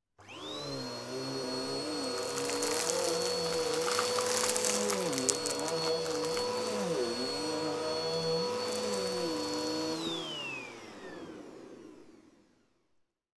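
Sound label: household vacuuming small debris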